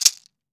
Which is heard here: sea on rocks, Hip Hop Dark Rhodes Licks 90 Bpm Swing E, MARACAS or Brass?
MARACAS